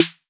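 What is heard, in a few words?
808 snare drum; Wizoo - Platinum 24 Electronic; CHR SD 808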